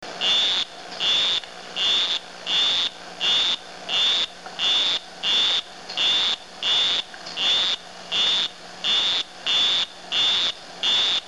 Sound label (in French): D granuliferus, chants